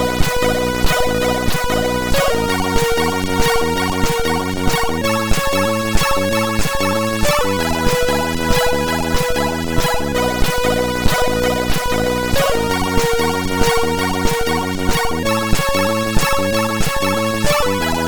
remix, MOS6581R4

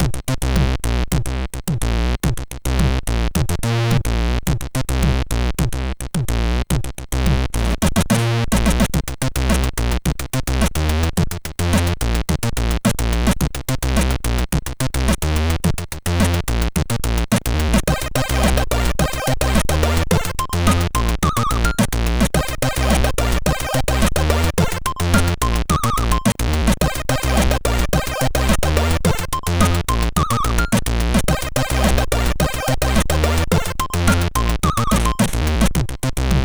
Shorty 2, sid MOS6581R3

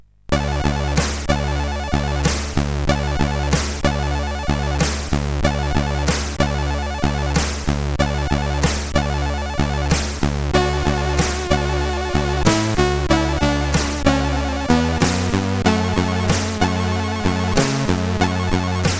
Metal Warrior 2, CSG8580R5

